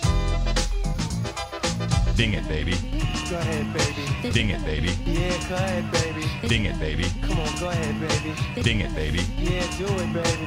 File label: 10sec jingle; No Agenda